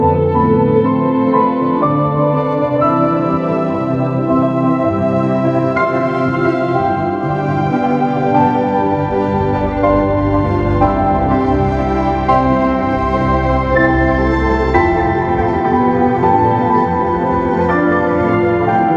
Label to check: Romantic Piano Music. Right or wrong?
Right